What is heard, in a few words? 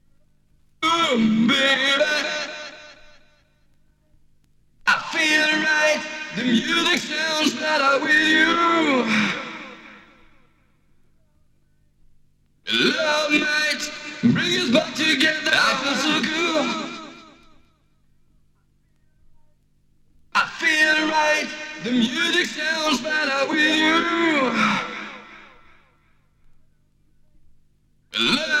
ACAPELLA